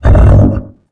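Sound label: biomech air voice punch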